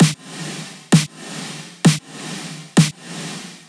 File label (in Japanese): ブルーミングリバーブ